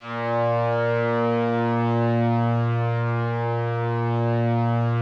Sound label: A#1, STRINGS, Yamaha VP-1; Music Store; JODYSTRINGS